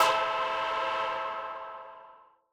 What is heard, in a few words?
M Dynamic Tech A3; KG MegaSaur